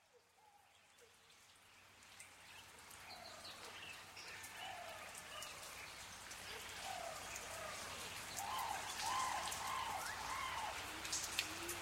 Tropical Mystery, Rain Forest; The Sounds Of Nature; Rain Forest Retreat

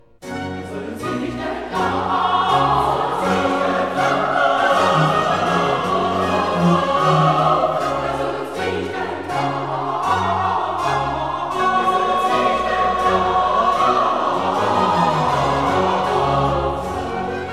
Coro; Handel Masterworks

